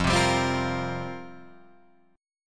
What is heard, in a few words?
F Suspended 2nd Suspended 4th F down Guitar Drop D